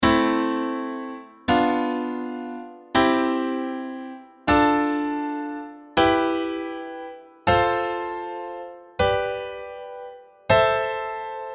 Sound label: Natural Minor Chords; Chords of the A natural minor scale